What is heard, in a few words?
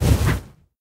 Fiery End; Focus